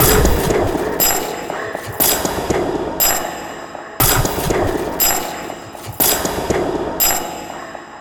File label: designed loop scene rhythmic tension 120 bpm; sonniss gdc